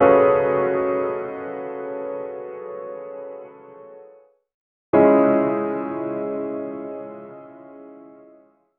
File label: noir piano chords